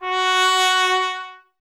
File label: BRS TP F#0CL, Trumpet; Miroslav Vitous